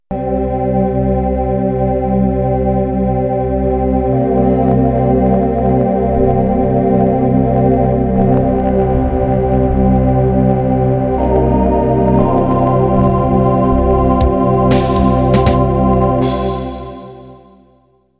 Jungle intro

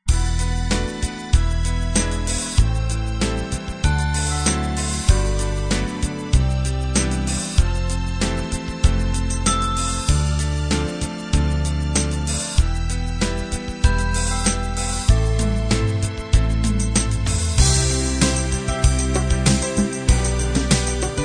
love ballad

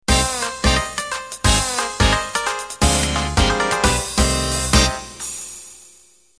Flapper end

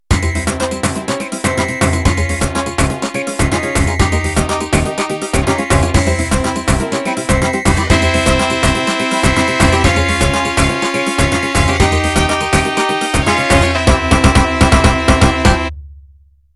Batucada Intro

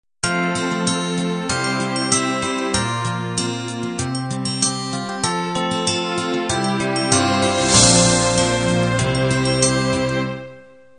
diva intro, ballad; Diva X & Diva Y compatible MIDI file Intros and Endings

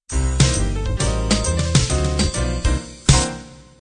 Cool Jazz End

